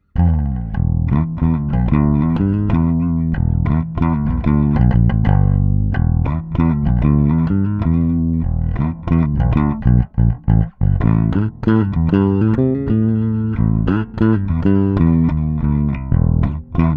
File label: Bridge Blues